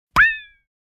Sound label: Cartoon accent funny impact